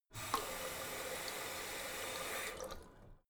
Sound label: tap flow light short, badezimmer